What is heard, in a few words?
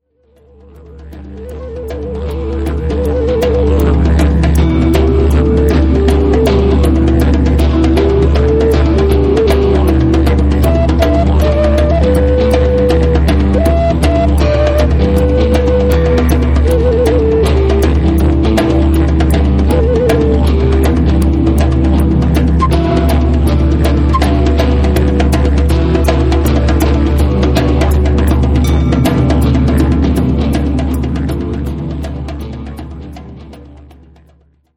Jungle Dance, drummer